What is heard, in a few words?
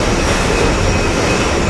crane, train; hl2; func train